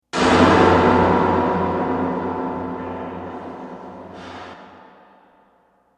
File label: prison noise